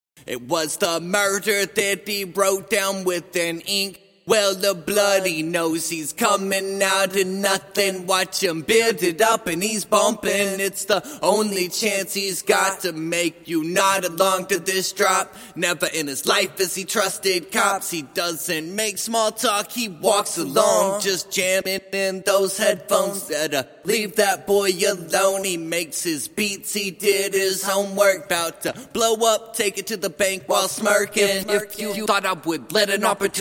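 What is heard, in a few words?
trap hip hop, 95, G minor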